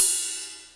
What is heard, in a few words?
Alesis Ride; hr16